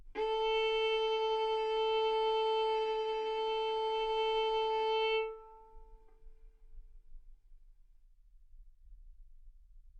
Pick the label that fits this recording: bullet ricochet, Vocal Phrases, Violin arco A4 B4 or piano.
Violin arco A4 B4